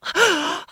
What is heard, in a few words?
gasp